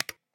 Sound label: Double click, notifications; Huawei U8160 Vodafone 858 Smart